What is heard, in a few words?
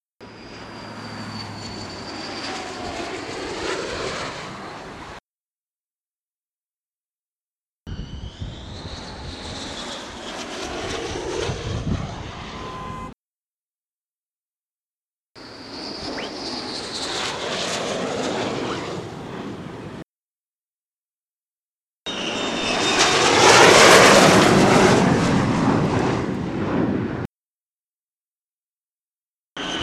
F-35 landing; leeuwarden